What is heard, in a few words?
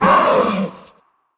orc vox die